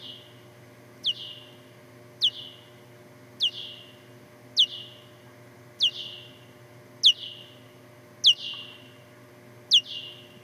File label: Big brown bat call; urban ecology